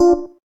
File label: gayageum, keytone